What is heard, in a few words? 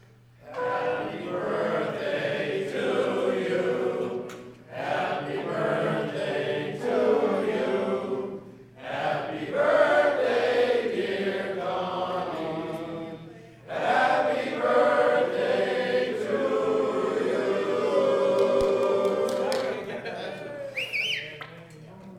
Happy Birthday, ensemble practice